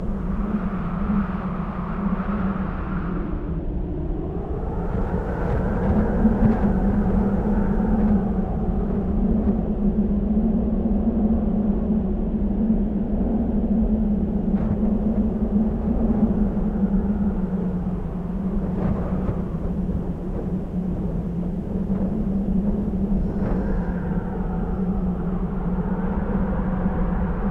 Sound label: Gusty Desert Plain Ambience Quad; Journey